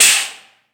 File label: METAL; AMG - Vince Clarke Lucky Bastard